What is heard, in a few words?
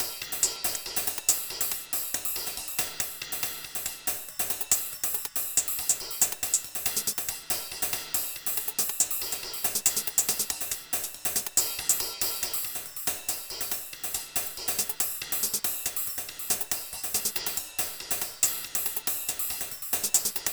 Cymbalz N Hatz Fazt 140, Percussion Combos; M-Audio; Pro Sessions VOL-38; Abstract World Fusion 2